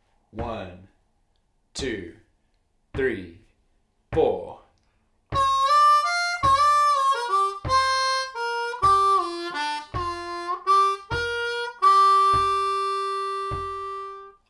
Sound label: Frustration Lick Slow, harmonica